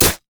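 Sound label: siege rider basic attack, minions; LoL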